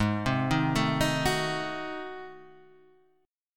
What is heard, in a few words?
Ab 7th Flat 9th Guitar Standard, chord; Ab7b9 arpeggiated; JGuitar